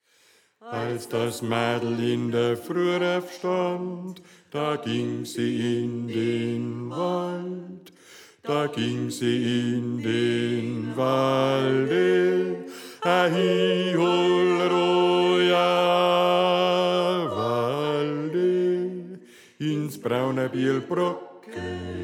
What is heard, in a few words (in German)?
Bass